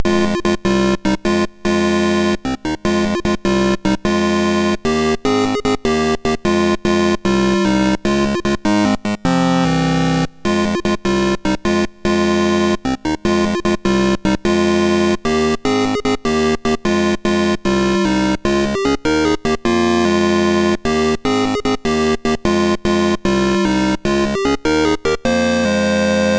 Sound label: sid MOS6581R2; Nine Princes in Amber